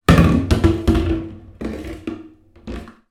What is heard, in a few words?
foley tub plastic drop into bathtub